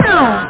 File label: spiked